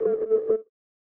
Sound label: Robot Transmission